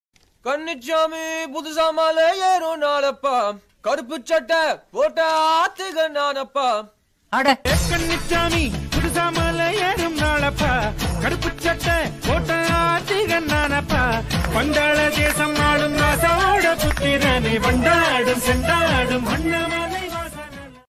new tamil bgm tamil ringtone new bhakti tamil; ayyappaswamy

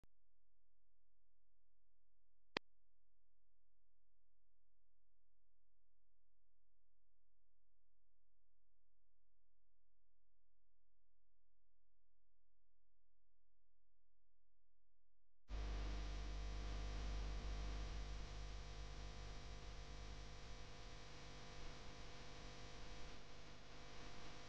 love BGM ringtone; thandel movie

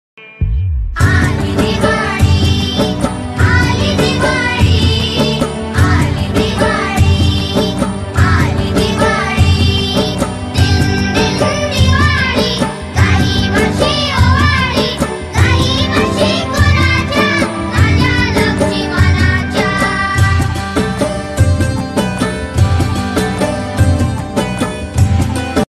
diwali marathi; happy diwali

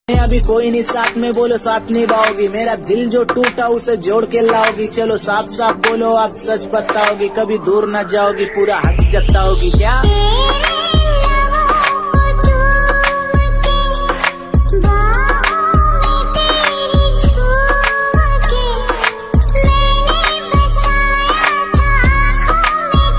new trending song ringtone romantic songs

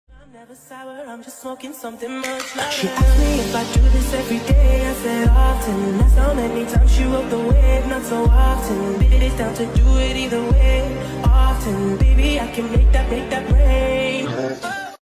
english songs speed up slowed

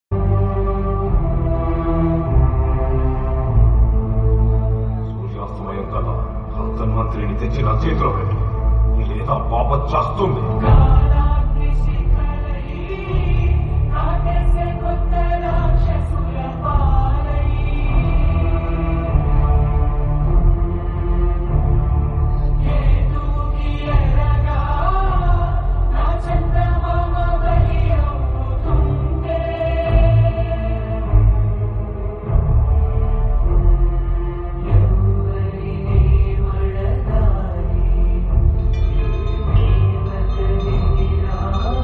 sad song; bimbisara movie

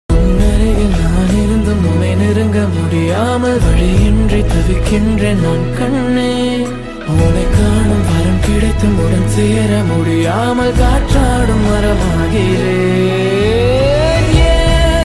Tamil song; the atypical family

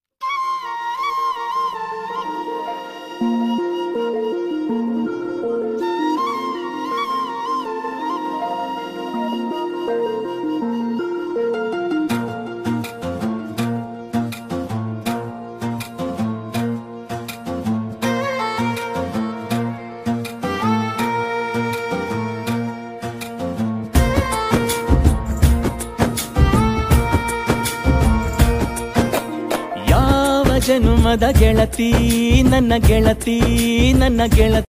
kannada song ringtone; challenging star darshan; katera

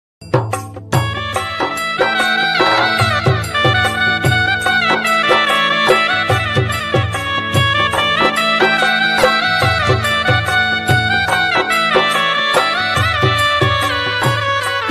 bgm tune ringtone; sanwliya seth

